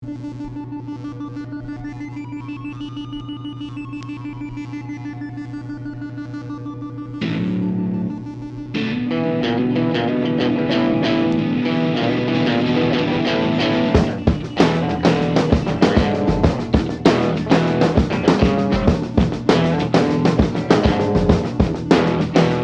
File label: blues E